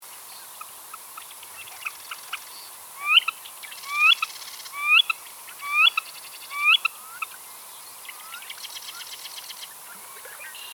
grebe a cou noir

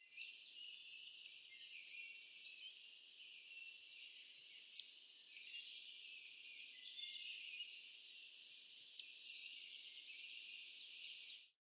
orite a longue queue

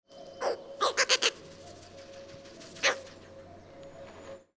coucou gris

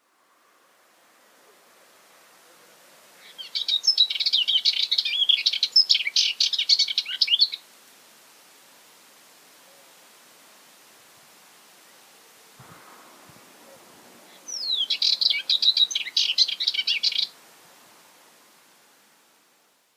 fauvette passerinette

